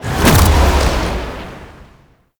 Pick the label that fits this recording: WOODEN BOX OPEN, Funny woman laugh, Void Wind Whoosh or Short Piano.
Void Wind Whoosh